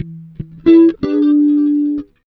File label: 92 BLUES, Acoustic Guitar Loops; Electric & Acoustic Guitar Loops; USB Soundscan vol.04; AKAI